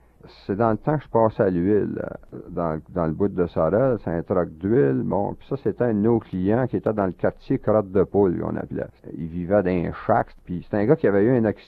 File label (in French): chant; CIBL; Sorel